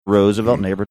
clearing his throat while introducing

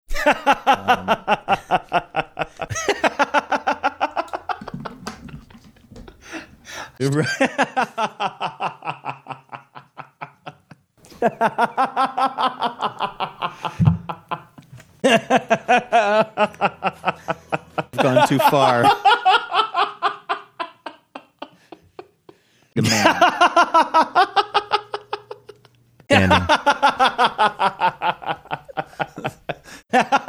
awesome laugh mashup

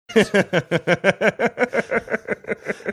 chuckling

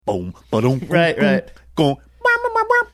singing theme; seinfeld

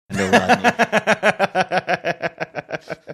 having good laugh

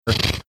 snorting

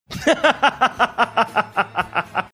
laughing

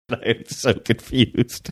laughing saying i am so confused

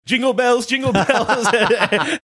jingle bells

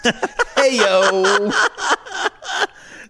awesome laugh

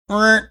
making funny sound

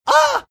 making funny sound

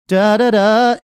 singing few notes from song

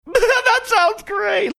laughing saying that sounds great funny

